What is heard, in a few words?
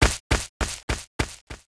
Step Leave; SE Manzai#27 (SE137 Man Step Leave)